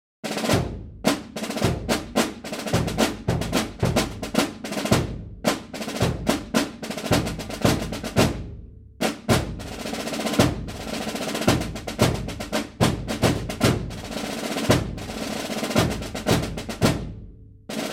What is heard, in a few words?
Military Drum Cadence